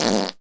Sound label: fart; SFX; farts